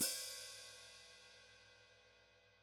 hihat splash, gretsch kit

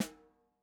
snare, gretsch kit

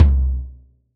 bass drum, gretsch kit

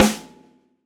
snare, gretsch kit

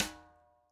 snare drag, gretsch kit

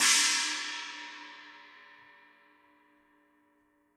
china, gretsch kit

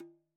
Udu Skin

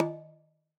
Udu Skin

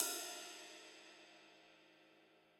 hi ride, gretsch kit

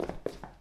footstep

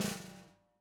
snare drag, gretsch kit

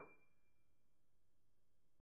piano pp, steinway

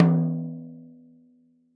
tom hi, gretsch kit

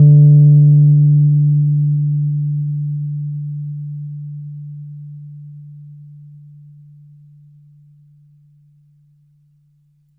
rhodes mark ii d2; fender rhodes mark II

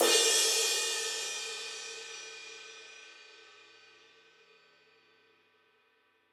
ride, gretsch kit

